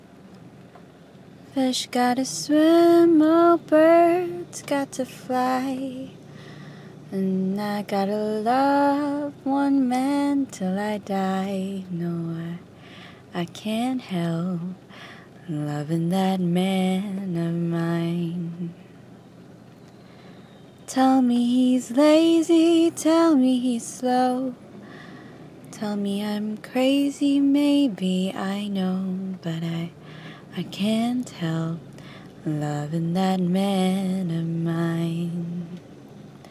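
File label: Acapella, Rainy drive, Wiper sounds, female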